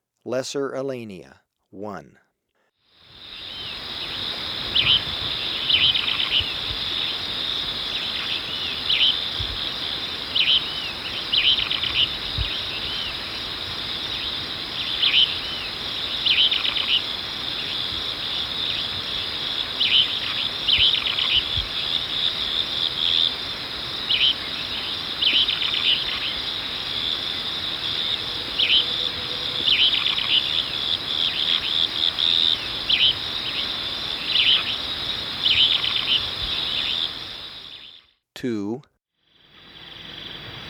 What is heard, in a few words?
Lesser Elaenia, Birds of Ecuador; S. America; Natures Songs